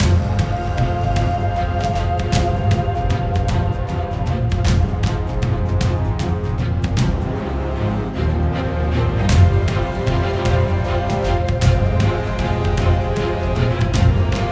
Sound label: Sea Combat Arctic Night